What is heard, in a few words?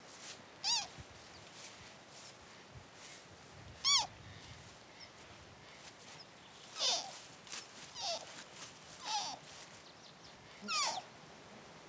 Cervus elaphus sibiricus calf; Calls of a Siberian wapiti (C.e. sibiricus) calf, which approaches a human, supposing that this is its mother